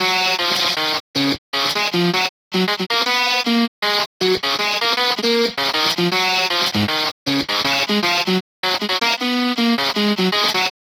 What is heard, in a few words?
riff; carol; w whitty; Toodles